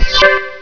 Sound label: auto